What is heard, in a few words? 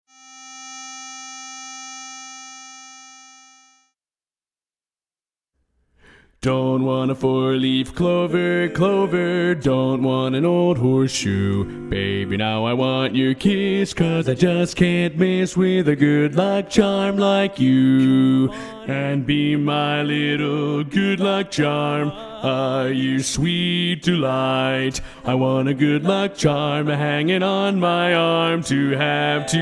bass predominant